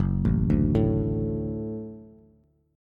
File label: Gb Minor Sharp 5th Gb Bass; arpeggiated; JGuitar